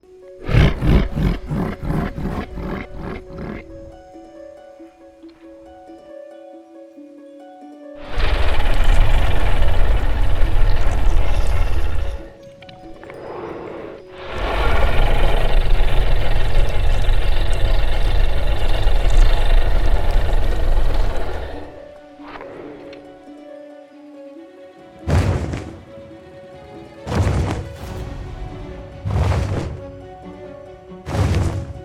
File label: Ice Dragon